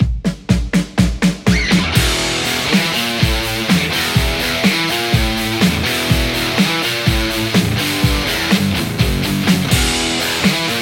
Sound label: strat; nickcrow wagnersharp